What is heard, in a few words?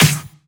Pre-Shifted Claps; Vengeance Samples